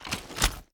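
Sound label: mg3 draw; tacz; daffas arsenal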